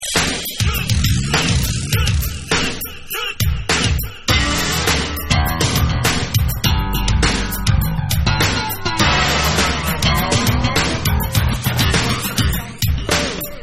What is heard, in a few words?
Dub Instrumental